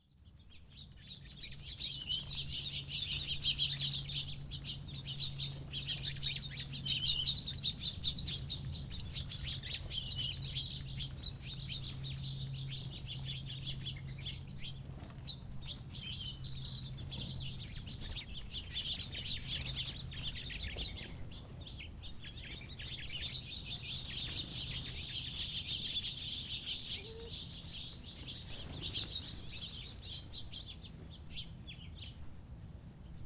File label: bird sounds